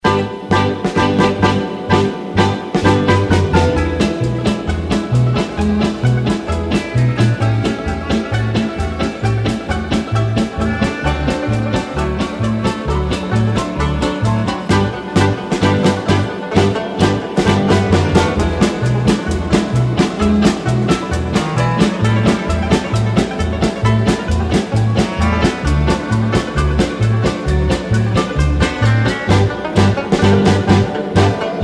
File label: music